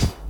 Dance Drums; Best Service ProSamples vol.15; AKAI

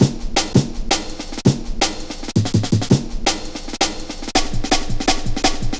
Pride, Drum Loops, 165.5, Jungle

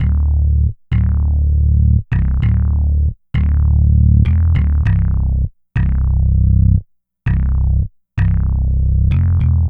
Synth Bass; Bass X

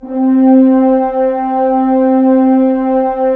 Horns; Roland - LA Composer vol. 4; HZ Horns

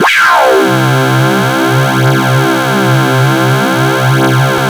Oscar bass

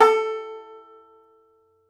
Tenor Banjo; E-MU Producer Series Vol. 7 – Old World Instruments; Plucked Strings

